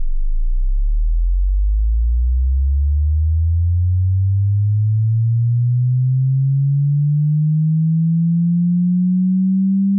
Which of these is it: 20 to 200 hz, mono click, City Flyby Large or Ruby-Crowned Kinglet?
20 to 200 hz